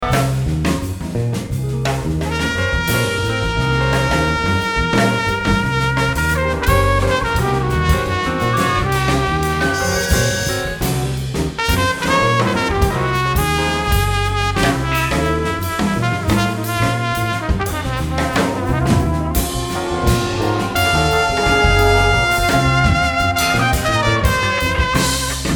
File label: trumpet and rhythm section free solo